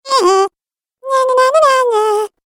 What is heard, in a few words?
Cartoon Game Voice Rude Sounds; Cartoon Voice Sound Effects